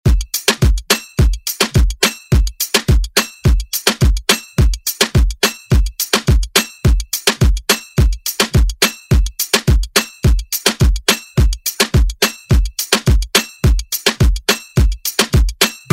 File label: moombahton redrum